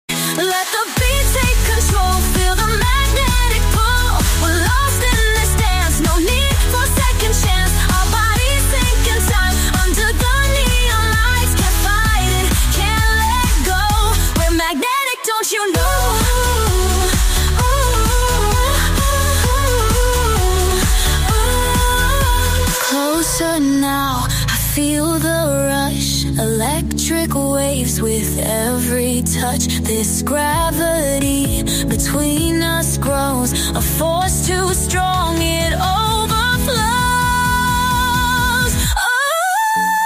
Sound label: dance pop electropop